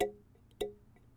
Small Can - Pluck Tab; Micro Pack

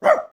barking, creature

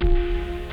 Distant Kick; BB 2HTC Samples Vol 4; Weird

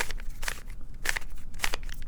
Big Cube Twist, Rubik's Cube

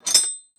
tools, wood metal; wood metal SFX

